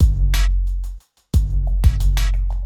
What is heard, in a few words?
Drum loop 90bpm